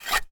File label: WoodScrape; Wood Pull-Release